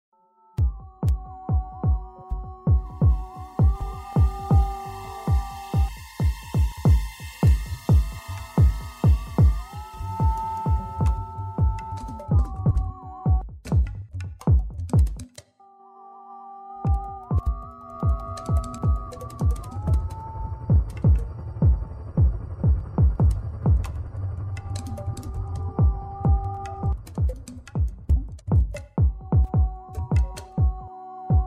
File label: Drum Percussion Song